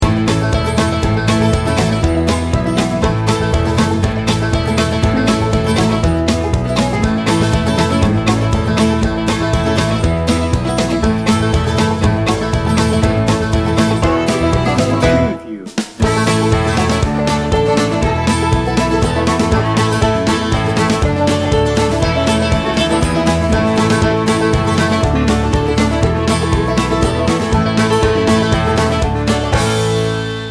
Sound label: bluegrass